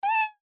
喵喵喵

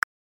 小米MIUI12

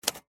复古打字机

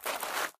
扫雪音效

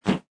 香蕉音效

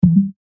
果冻音效

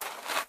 踏雪音效

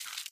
回收音效

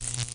电流音效

